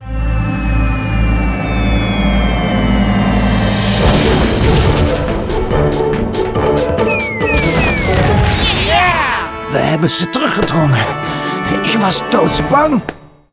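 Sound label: Outro Victory Dutch; arthur and the revenge of maltazard; wii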